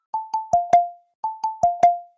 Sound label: Xiaomi Redmi 10 Prime Notification Ringtone